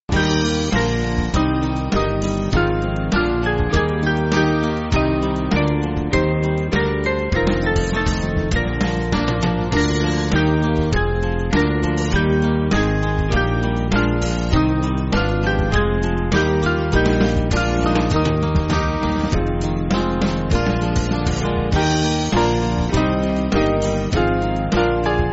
Sweet The Moments, Band

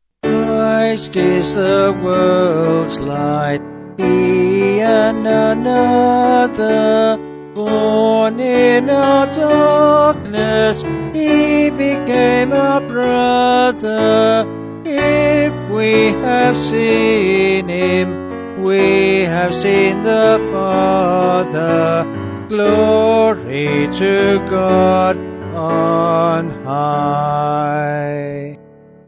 Vocals Piano; Christ Is the Worlds

